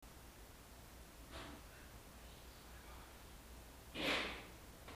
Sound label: Swamp Room